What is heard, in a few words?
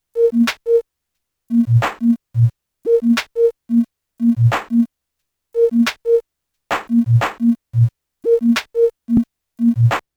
pad Clap